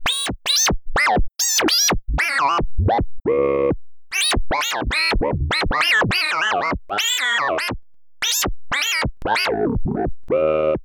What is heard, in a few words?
Song Scratch